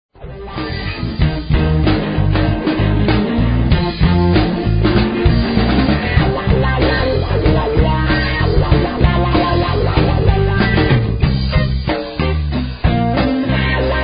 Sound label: Jungle Beat Low, Music